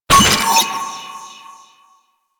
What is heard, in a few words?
Courier Trinket Collected Final; Warframe; Duviri; Courier Delivery